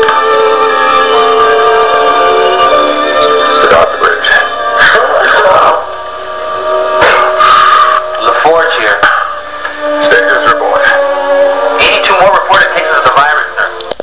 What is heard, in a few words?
sneeze; worf